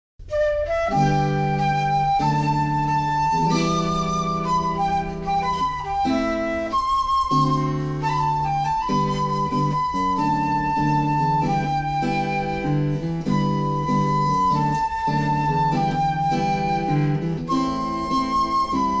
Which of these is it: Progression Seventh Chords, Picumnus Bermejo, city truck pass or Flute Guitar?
Flute Guitar